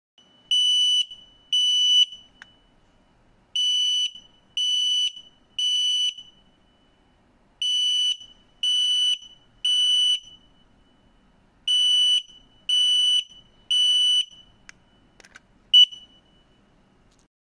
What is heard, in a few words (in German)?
alarm, rauchmelder